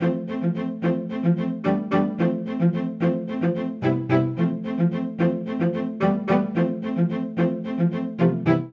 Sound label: Ostinato Rippling C Major 110 bpm, String Quartet; MIDI Clips; Ableton; Spitfire Audio